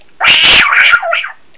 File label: cat